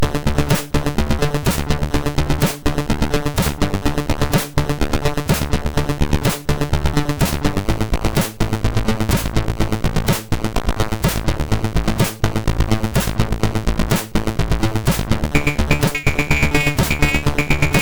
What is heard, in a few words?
Techno; MOS6581R3; Moon